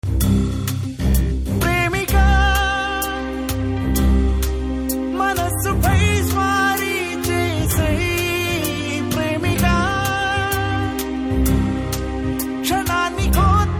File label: Male Version Telugu Ringtone